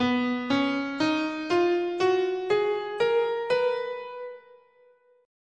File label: B Lydian, scale